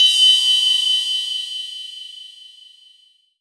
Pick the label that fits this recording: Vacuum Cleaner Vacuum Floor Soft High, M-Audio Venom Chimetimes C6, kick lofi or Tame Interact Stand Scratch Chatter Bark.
M-Audio Venom Chimetimes C6